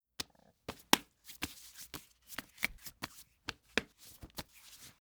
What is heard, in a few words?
Hands Wipe; Fairlight Sound Lib